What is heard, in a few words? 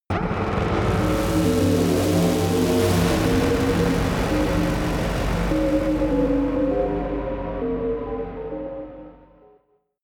buzz crying bells, drones; musikelemente